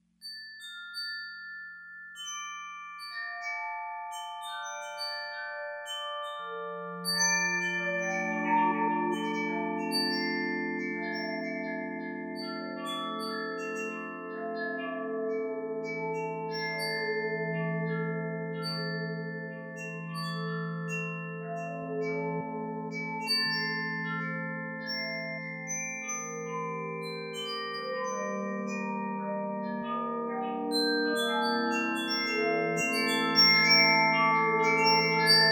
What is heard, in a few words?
Chimes; Zen Chime